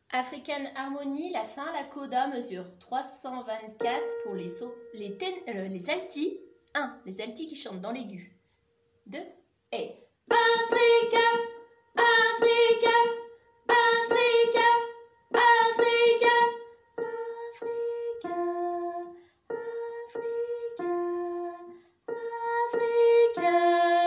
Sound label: alto